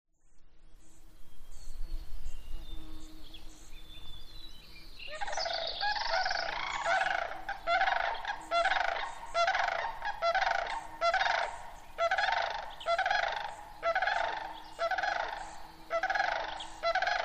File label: sandhill cranes